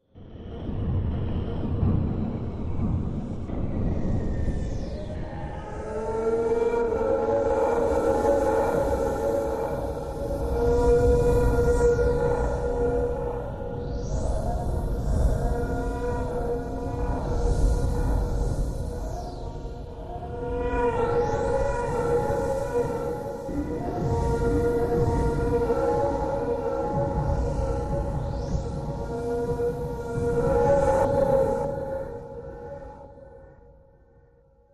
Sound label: Dist Fear Low Subway Tun, sci-fi ambience